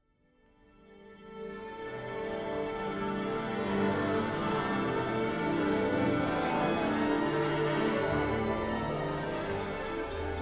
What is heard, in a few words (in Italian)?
L'orchestra accorda